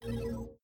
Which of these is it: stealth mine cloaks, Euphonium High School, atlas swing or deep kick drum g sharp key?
stealth mine cloaks